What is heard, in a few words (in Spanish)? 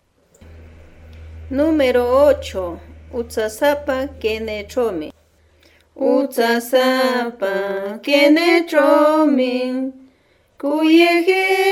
cancion